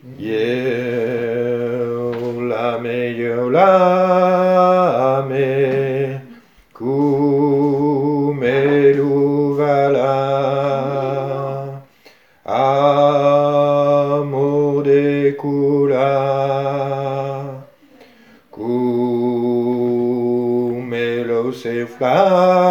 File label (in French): basse, Chansons